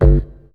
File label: BASS Small Memory Sampler; Polyend; Legowelt